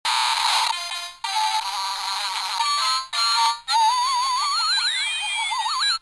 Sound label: wiggle bar spazz